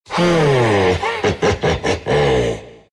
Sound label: dragon laugh, dragon knight; dota